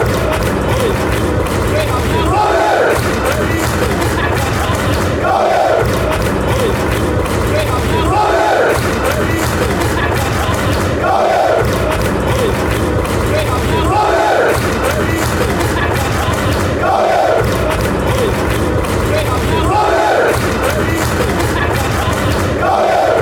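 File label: clap col u, football chants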